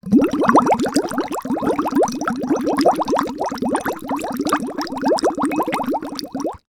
food drink bubbles blown into glass through drinking straw